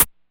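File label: Closed Hat, DIGITAL KIT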